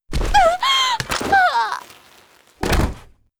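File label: monster death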